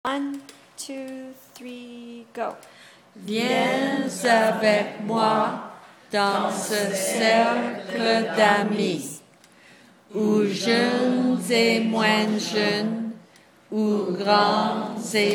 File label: Circle song french